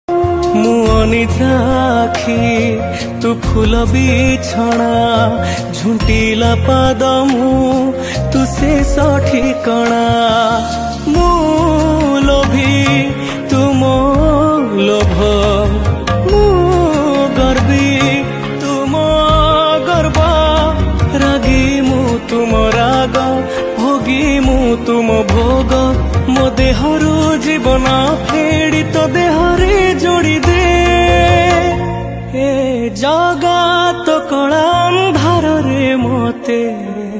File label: he jaga new odia ringtone odia bhajan instrumental ringtone